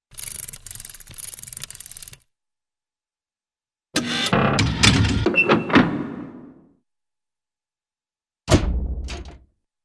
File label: Short Snare Drum Roll - Metallic Movements, cartoon; Hollywood Edge; Cartoon Trax